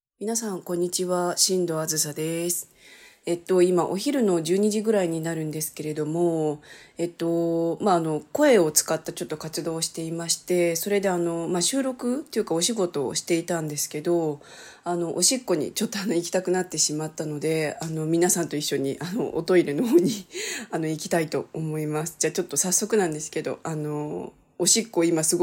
お昼のおしっこ音

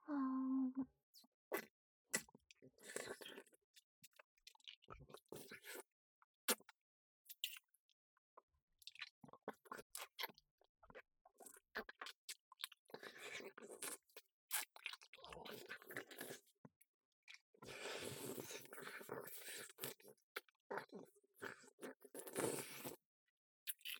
フェラ 舐める系, ASMR

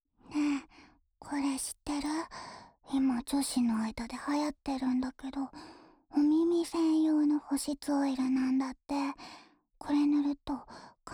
安眠用保湿マッサージ（右耳）, ASMR

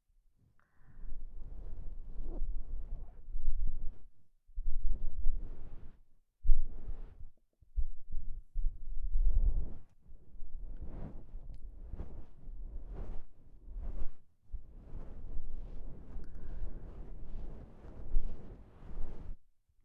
タオルマッサージのみ, ASMR

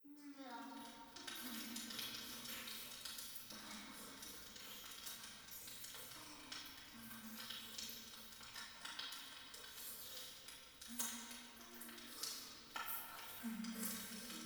トイレ フェラチオ 暖炉

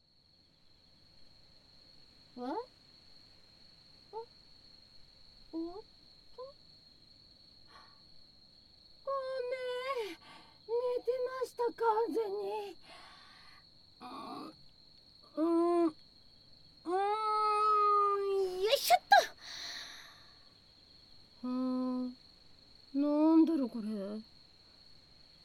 お姉さまによるゆったり耳かき, ASMR; お返しの……耳かき!!

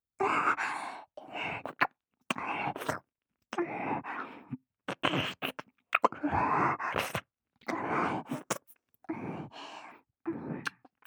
耳舐め

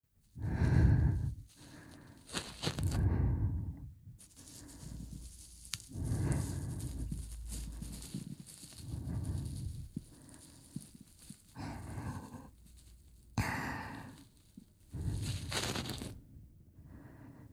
耳剃毛ループトラック, ASMR

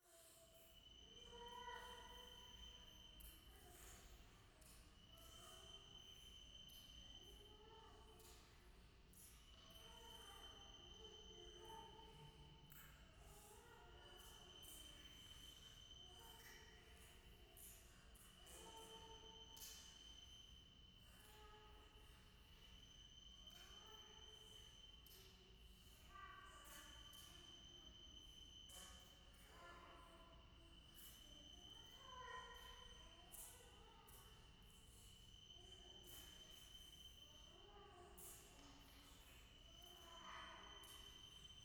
夢の中 イラマチオ 山奥

